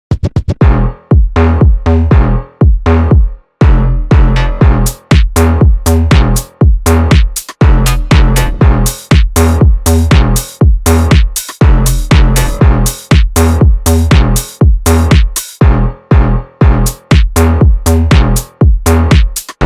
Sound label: instrumental minusovka